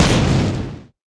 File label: skill explo